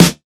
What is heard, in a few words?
old school subtle reverb snare drum sample f key, steel snare